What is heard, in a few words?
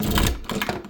unlock, key door; environment